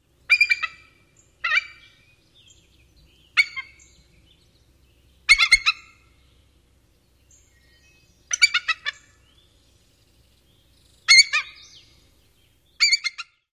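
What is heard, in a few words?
alarm calls of perched adult, eagle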